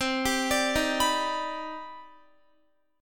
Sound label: C Minor Major 9th C Guitar 4F,4A,5C,5G,5C,6E; CmM9; arpeggiated